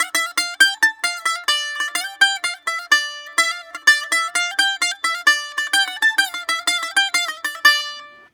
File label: 128 BAG, BAGLAM, Mediteranean Traditions; PH BAGLAM